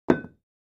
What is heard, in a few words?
Free Weights Exerci